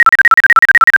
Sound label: tone; cuddle n care